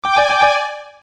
Warning, notifications; Coolpad; Rogue

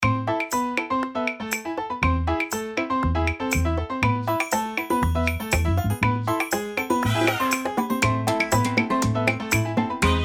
Salsa, ringtones; Tecno Phantom V Fold

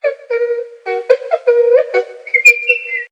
Happy Singing; Motorola; Droid 2 R2 D2

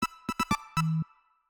Comic, Notifications; Samsung Galaxy S25 Ultra; Fun

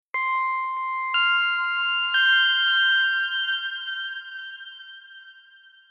Dreams Alert; Nokia; 8800 Arte; Alerttones

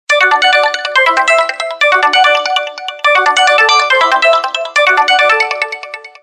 Waterdrop Tone; Samsung; GT-S8000U Jet Taiwan

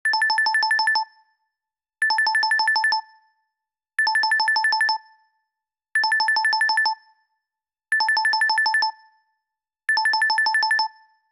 Simple Simple Bell, ringtones; Rollable LM R910N; LG

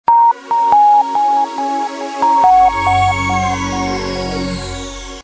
ringtone tones; Vivatel

